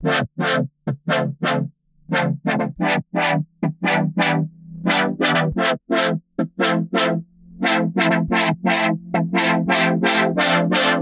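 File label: 174 synth loop future soul A minor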